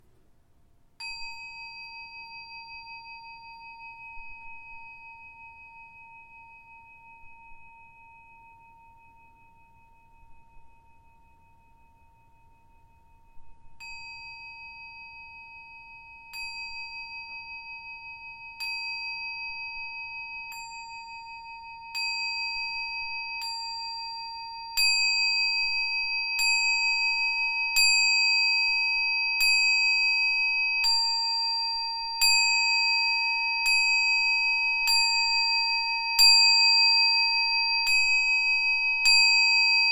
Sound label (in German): Klangschale